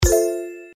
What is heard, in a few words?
wild hit; Shopping Fiend